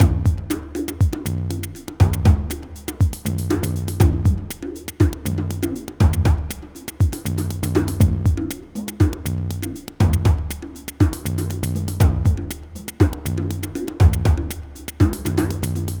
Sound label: Terrestrial Hybrid, Processed Percussion